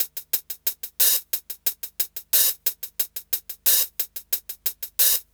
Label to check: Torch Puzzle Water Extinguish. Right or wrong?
Wrong